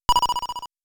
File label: ball bounce paddle stereo